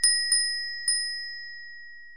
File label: call